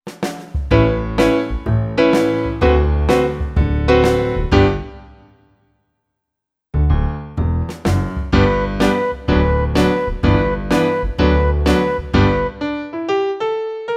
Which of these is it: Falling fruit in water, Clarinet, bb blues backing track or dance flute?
bb blues backing track